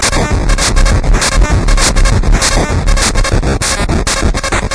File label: angry